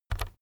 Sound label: keyboard external key press very fast soft